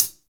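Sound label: hi hat; Best Service Gigapack 2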